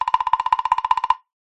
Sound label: cartoon run fast; zapsplat